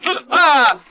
"ooh ahh" male

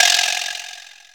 vibraslap

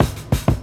all kix, Drum loops, Rolls

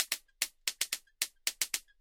Drum loops; Just Add Drums

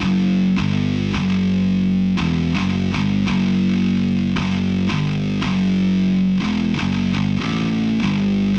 guitar

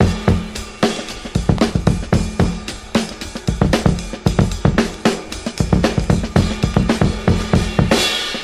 stacato patterns, drum loops, funky

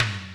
Toms, Real

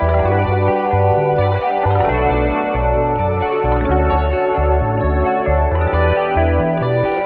guitar, Phonky loops

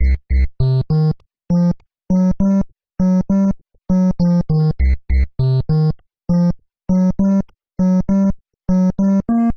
sneaking about, Electronic Loops

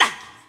ah, Voice ooh ahh

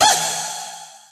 eep, Voice; ooh ahh

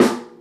notary, snares real

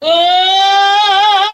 "woah" SUNG; ooh ahh

